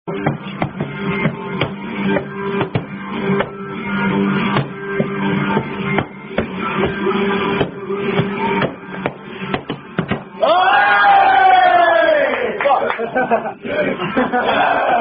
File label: Slaps Drinking Game; A Game Of Slaps